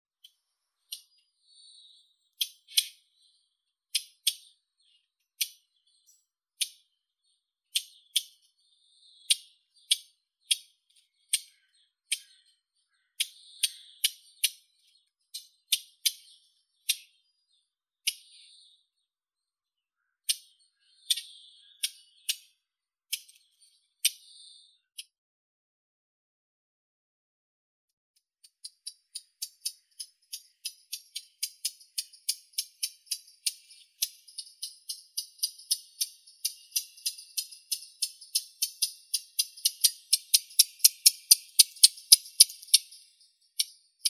slavka chernogolovka sylvia atricapilla, zvuki prirodi

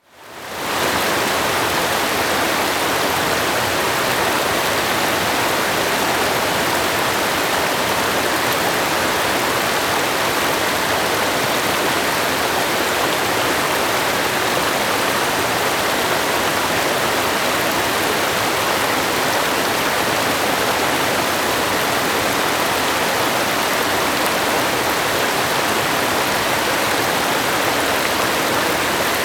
s gori bezhit potok provornii, zvuki prirodi

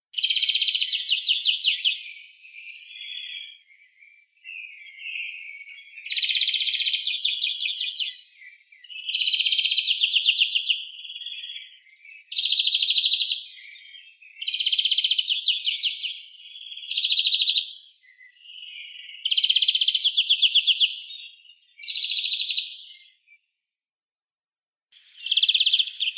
zelenushka obiknovennaya chloris chloris

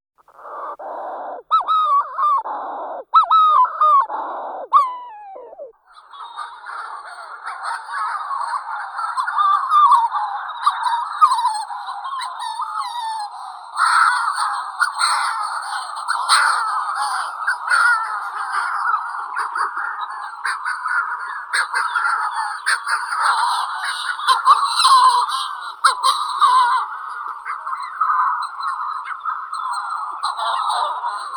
burevestnik malii puffinus puffinus

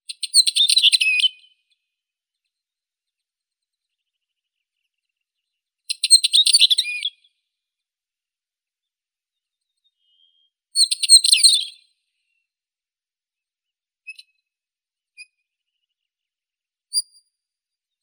kamenka obiknovennaya oenanthe oenanthe

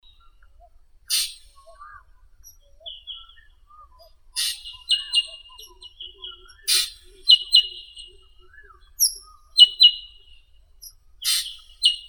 drongo chernii dicrurus macrocercus

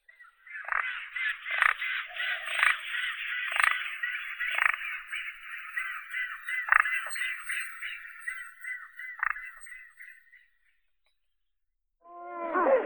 pelikan rozovii pelecanus onocrotalus

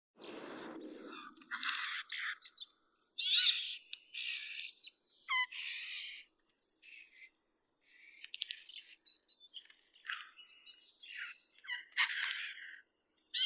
urok fringilla montifringilla

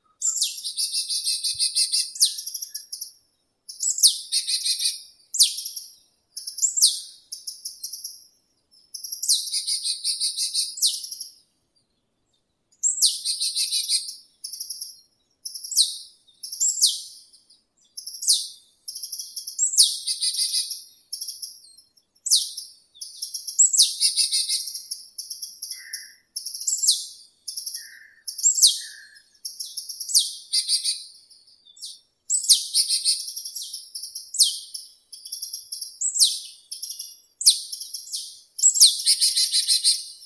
gaichka chernogolovaya poecile palustris

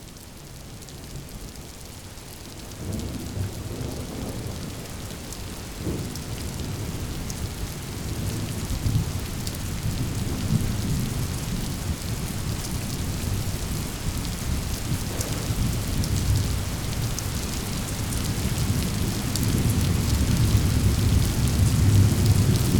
dozhd raskati groma

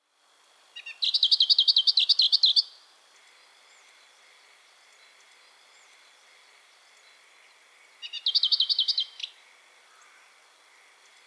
zvuki prirodi slavka melnichek ptitsi

